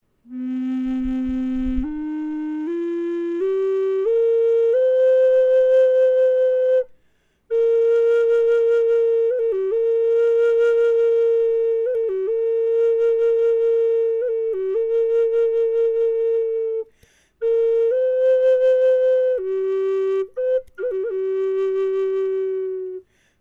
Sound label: walnut